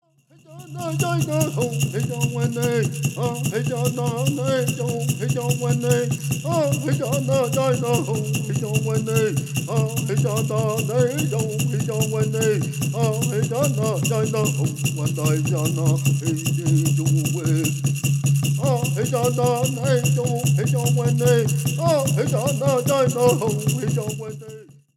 Peyote Song

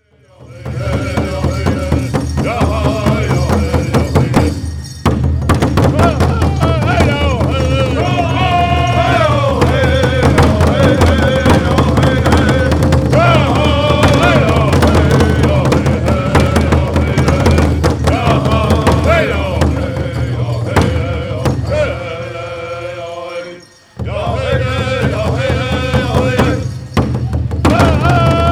Yellow Spotted Horse Song

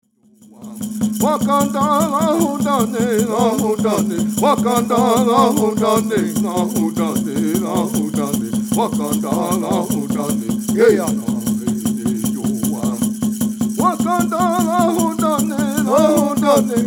Ceremonial Song

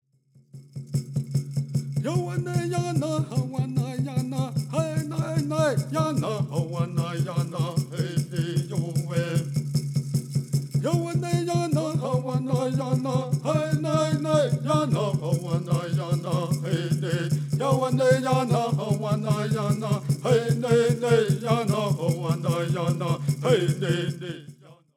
Prayer Song

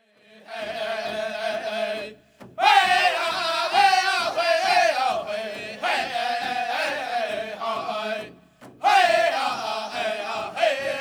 Grass Dance

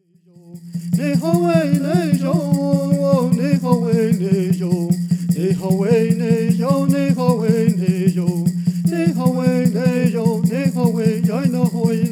Vocals

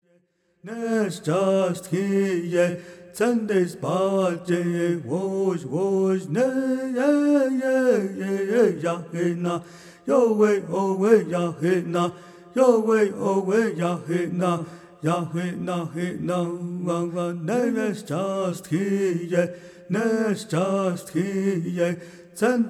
Owl Song

